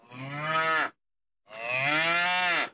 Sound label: cows